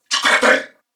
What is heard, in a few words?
Surprised Corpus Venus Male, en; Dialog; Barks; Battle; Warframe; CorpusVenusMaleB